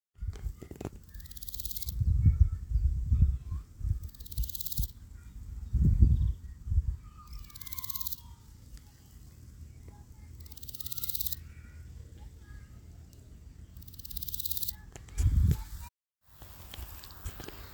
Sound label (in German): Große Goldschrecke